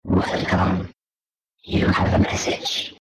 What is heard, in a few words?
Alien